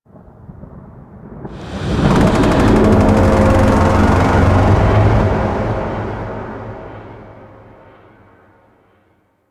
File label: Void Dragon Vocal Distant Idle; Enemies; Vocals